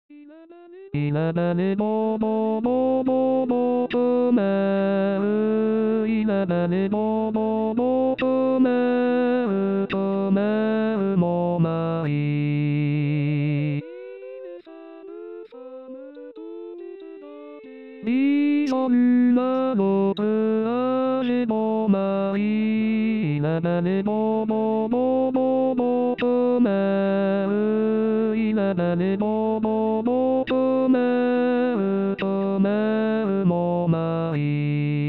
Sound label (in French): Il est belle et bon Tenor